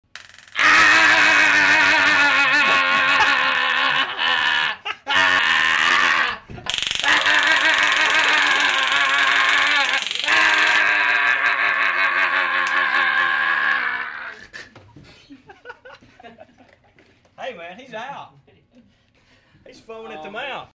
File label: Zap